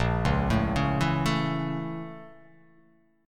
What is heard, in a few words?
A sharp Minor Major 7th Flat 5th A sharp; A#mM7b5 arpeggiated; JGuitar